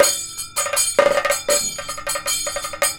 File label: KATAK, Indian Traditions